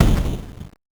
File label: Sound Menu File Destroy